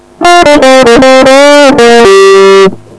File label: quick lick; guitar